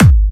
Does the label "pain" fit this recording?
No